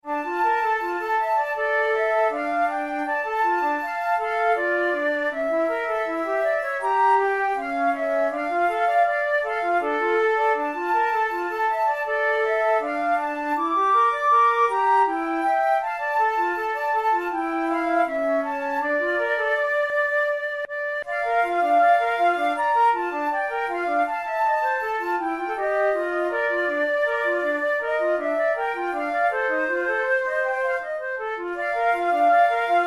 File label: duets, flute; kohler